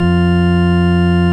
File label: ORG VOX, Keys of the 60s and 70s; Roland LCDP09 Keys of the 60s and 70s 1; ORG Continental